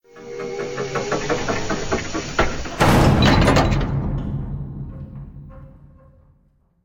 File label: Big Door Close; scpcb-godot